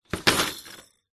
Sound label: musical percussion items kids in plastic container set down; zapsplat